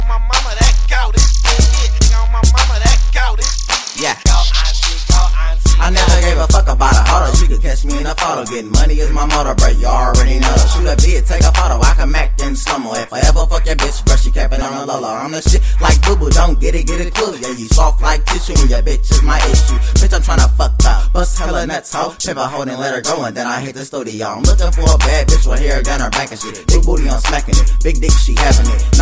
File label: Beat Hit